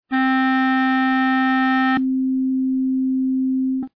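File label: clarinet cut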